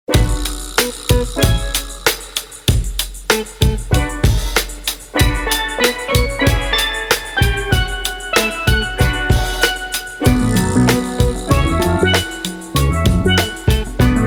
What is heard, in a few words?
Steel Band